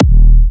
Slobber D#4, Big Room Kicks

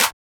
Clap

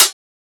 Closed Hat

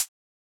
HiHat

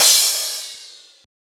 Crash

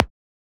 Transient; FLPRO

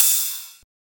Ride; FLPRO

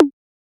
Perc, Percussion; FLPRO

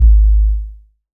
Sub BD

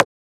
Transient; FLPRO

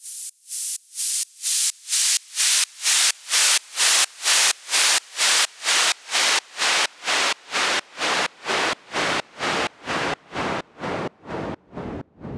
Fall Side Chained

